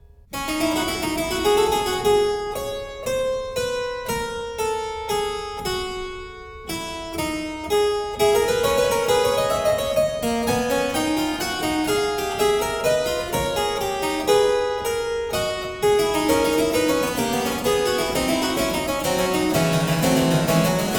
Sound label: Fuga, Keyboard Works, The Well-Tempered Clavier; BWV 875 d II; Klassika; Bach, Johann Sebastian